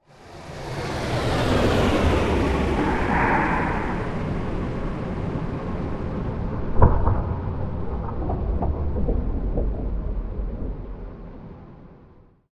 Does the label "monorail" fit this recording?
Yes